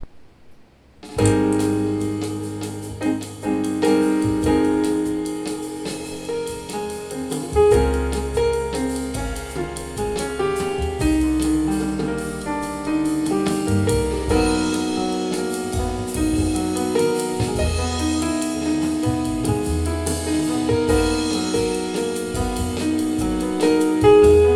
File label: JAZZ